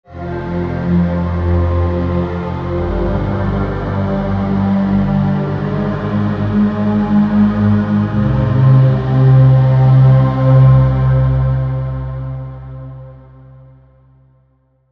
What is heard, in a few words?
Pad, Spire; Coherence Phase; Routed Sound; Spire Expansion; Quantum Enforcer Vol.1